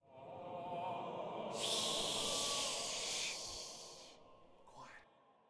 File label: Singing; Act It Out A Game of Charades; PS4; Wii U